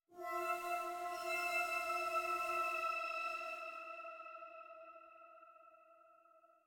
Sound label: Progression Stinger